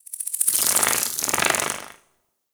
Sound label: Narmer Outpost Winged Insect Passby; Warframe; The New War; Ambience